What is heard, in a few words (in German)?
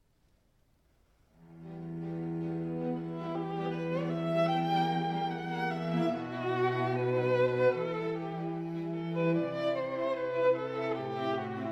streichquartett e-moll; felix mendelssohn bartholdy; op44 2